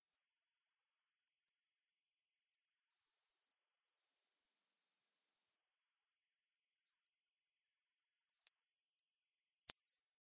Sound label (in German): seidenschwanz; Bombycilla garrulus